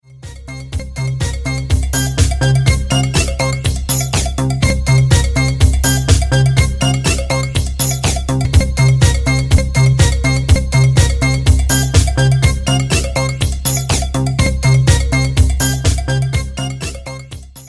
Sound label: Funky Tone